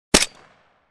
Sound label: Semi automatic sniper rifle single shot